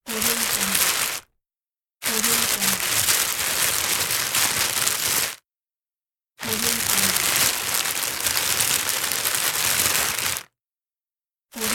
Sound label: the plastic bag rustle